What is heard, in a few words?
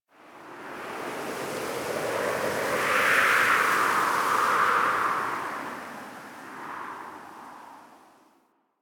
Camp Wind Gust A; Warframe; Quests; TheNewWar; Ambience; Drifter Camp